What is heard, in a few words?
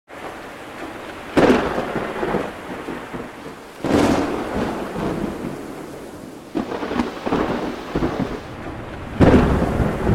lightning